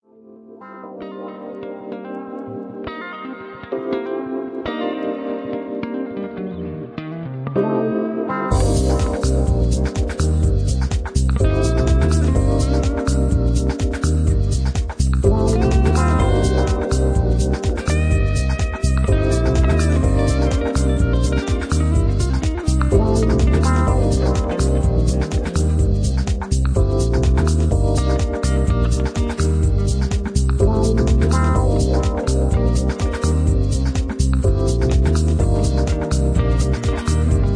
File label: romantic